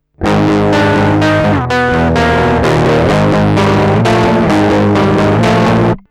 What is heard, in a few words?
Hagstrom Riff